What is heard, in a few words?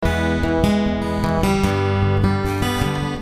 Picking E,G 75